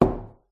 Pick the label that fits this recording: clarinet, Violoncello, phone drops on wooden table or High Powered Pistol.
phone drops on wooden table